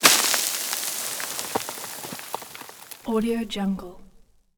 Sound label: rupture sand impact; soundmorph